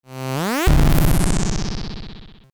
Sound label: Random Sound; Europa